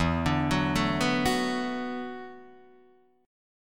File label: E 7th Suspended 2nd Suspended 4th, Guitar, DADGAD; chord shape